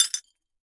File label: Shatter; blastmorph pinball; xbox series xs